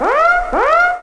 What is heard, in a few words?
FX SIRE; MIX FX; E-Lab; X Static Goldmine 2; akai